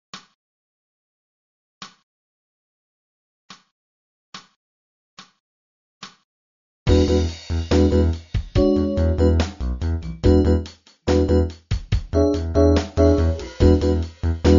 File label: Lip Slurs Up Track Bb 72